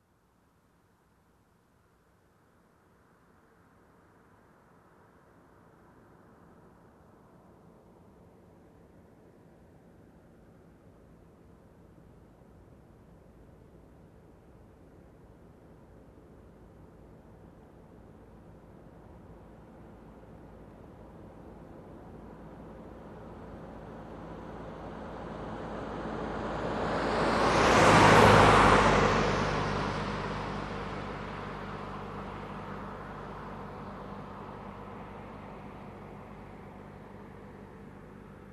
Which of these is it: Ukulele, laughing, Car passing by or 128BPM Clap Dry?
Car passing by